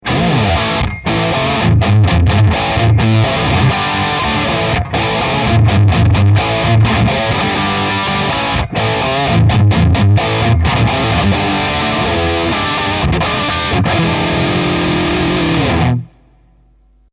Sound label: Heavy Bridge